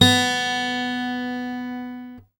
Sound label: GUI Ac., GUIT AC; Best Service Gigapack 2